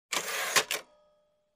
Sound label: Rolling a paper on printer